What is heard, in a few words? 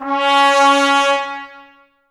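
trumpets C#, brass; 90 s Sample CDs; Miroslav Vitous; Woodwind Brass Ensemble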